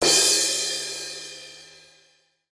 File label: cym